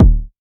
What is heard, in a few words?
low tone kick single hit d sharp key; kick drum